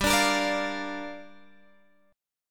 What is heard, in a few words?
G Minor 7th, Guitar, Open G